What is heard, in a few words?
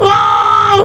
uuuahhhh